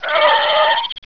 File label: moan; poke646